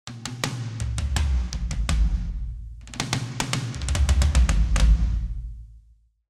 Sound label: toms, punk rock kit; wild boar; klangmacht